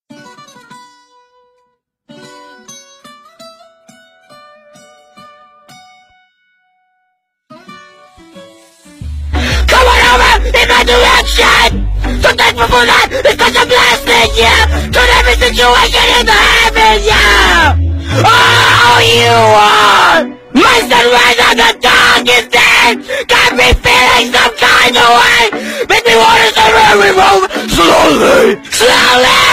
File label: earrape